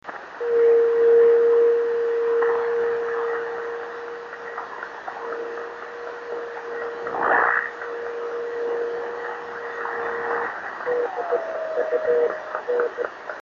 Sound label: 26670KHz